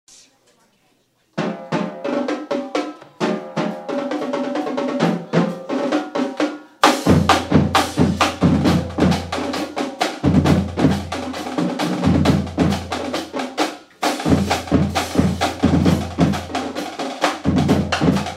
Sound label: drumline, marching band